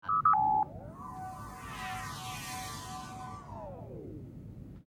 replicator

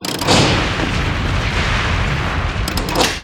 borg sound; Borg Sound 4

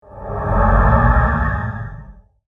decloak; cloaking; romulan

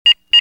computer beep sequence

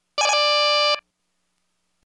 alert, computer

